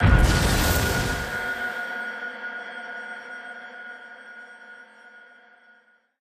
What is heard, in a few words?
deactivate, beacon; Minecraft; Votive World